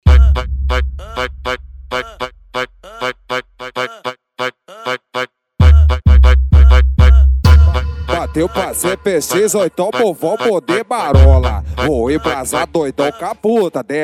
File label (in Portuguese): especial funk